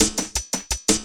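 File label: LOOP snare drum, Drum & Bass, 170 BPM; Ueberschall; Drum & Bass Resonance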